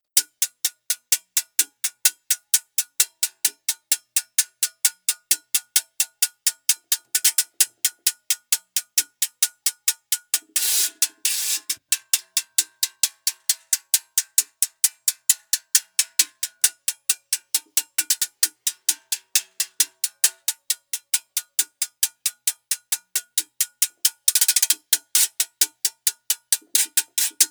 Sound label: Güira